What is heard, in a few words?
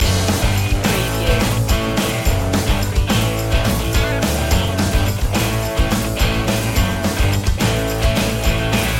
wearing the sunshine loop